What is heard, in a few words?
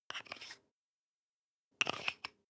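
toothpaste close twist hard, Bathroom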